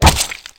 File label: hit flesh, knife; csgoknife